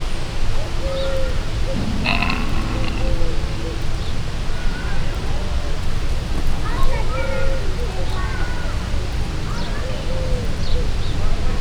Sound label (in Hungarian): egyet megszólal erősszel, varacskos disznó; Győri Zoo 2014